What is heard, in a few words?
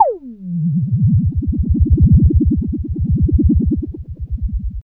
FX SWEEP, SYNTHSWEEPS